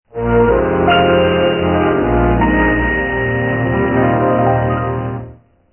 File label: Opening music